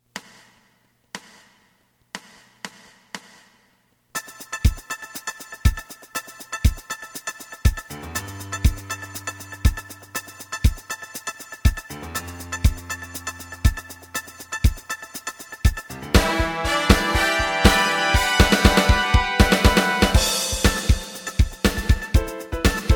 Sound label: bass